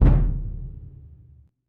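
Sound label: Music Rituel Migration Perc 120; From Dust; ps3; xbox 360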